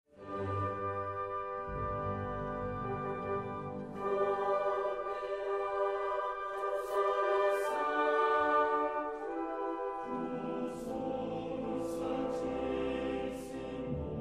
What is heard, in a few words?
messe gloria